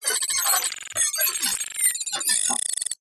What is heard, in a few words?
science fiction cyberpunk computer equipment or machine chirps beeps glitches; zapsplat